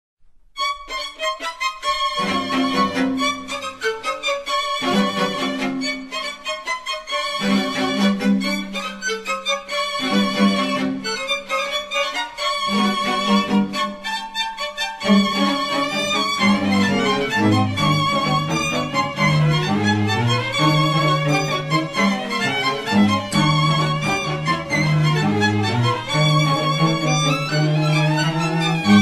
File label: String Quartett